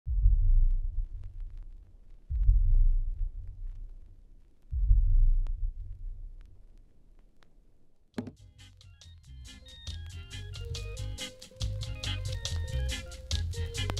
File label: Rhythms of Reggae from the 60s to 80s